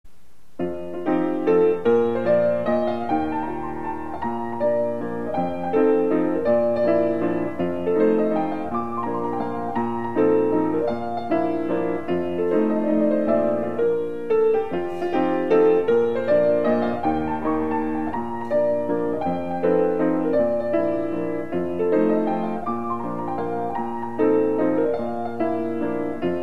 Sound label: Mazurka